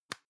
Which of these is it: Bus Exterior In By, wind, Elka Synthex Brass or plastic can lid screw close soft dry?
plastic can lid screw close soft dry